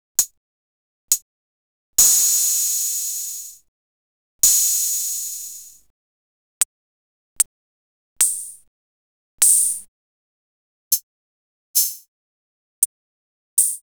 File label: Roland, drums percs; drums&percs (chains); X-Static Goldmine